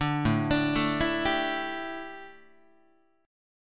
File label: A 7th Suspended 4th, A, down, Guitar